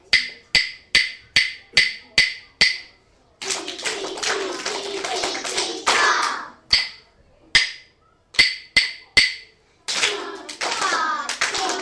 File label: Echo Clap Name Rhythms